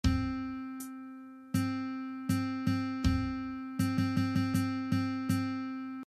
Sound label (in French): Solfege